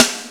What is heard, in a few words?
RY30 Snare; Drums; Yamaha RY30